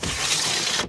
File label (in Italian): porta scorrevole